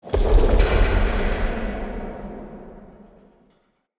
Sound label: Cave Mine Distant Boom